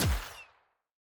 Lowered Sciweap Shot Small Gun Bullet Snapper

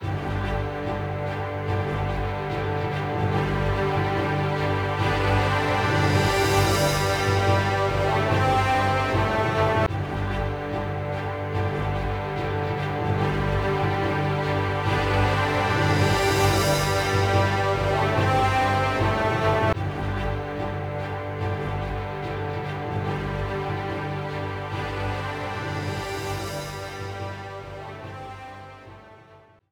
Battle Intro; Might and Magic Clash of Heroes

